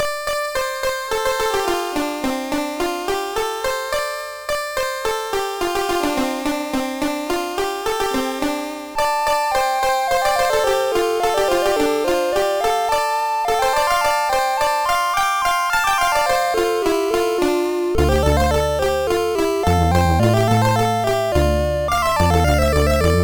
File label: tape version MOS6581R4; Tai-Pan